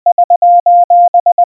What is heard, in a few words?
SOS, ... --- ..., Морзе; Международный сигнал бедствия; Генератор азбуки Морзе